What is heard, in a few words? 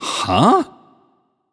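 nonverbal confused; voicepack; smite; merlin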